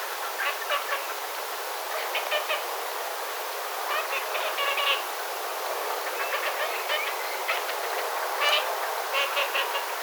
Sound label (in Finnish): onko sinisorsien ruokailuaantelyja